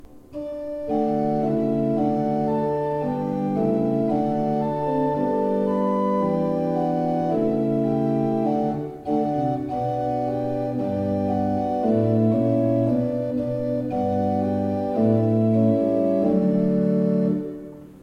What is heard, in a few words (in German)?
Vermeulen 5 I P, orgel